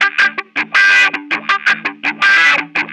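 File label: Chill Pop Wah Guitar 8th, Emin, 082 bpm; Pop Rock; Chill Electric Guitar; Pro Tools Essential Loops; Digidesign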